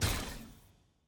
sfx ui pass bundle button click

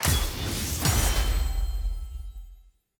sfx honor vote outro low spec

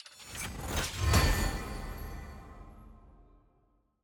loot portal open promotion intro

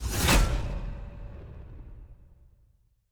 missions intro

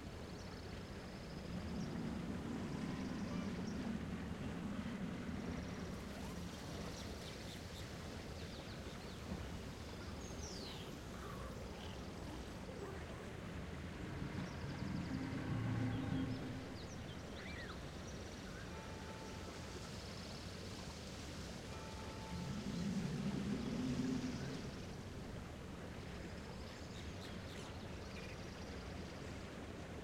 dragon amb dock; tft

